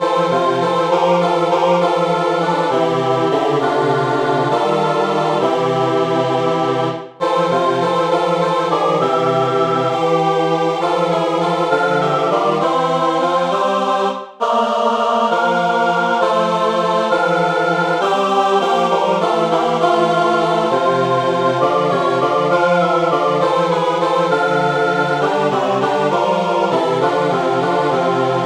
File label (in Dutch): Moederke is uit, SATB